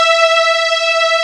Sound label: Textural Strings